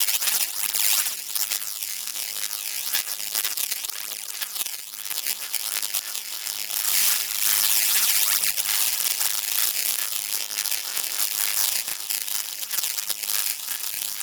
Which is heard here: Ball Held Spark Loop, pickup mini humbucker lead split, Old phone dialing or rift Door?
Ball Held Spark Loop